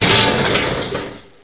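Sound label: metal crash; tnpdemo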